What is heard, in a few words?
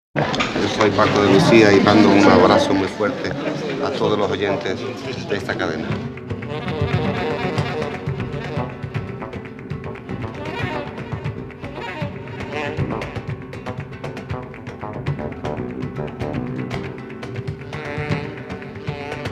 jingle